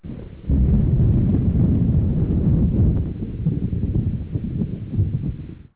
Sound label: exit windows